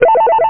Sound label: Extra Ship; Bedlam